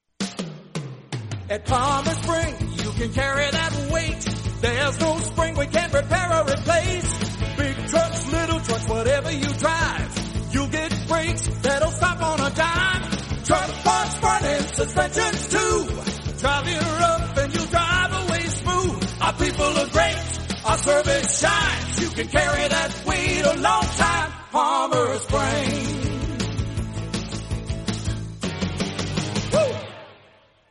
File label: spring ad jingle